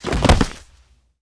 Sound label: BF with Fabric; Fairlight Sound Lib